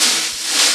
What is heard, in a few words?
Circle Brush; Proteus 2000; Digital Sound Factory Proteus 2000